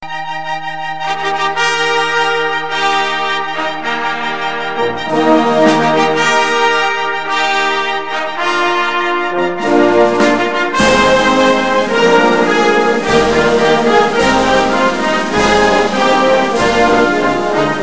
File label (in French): hymne, l'hymne olympique; REMISE DES MEDAILLES; biathlon